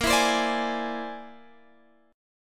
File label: Bb 9th Flat 5th Bb down Guitar Drop D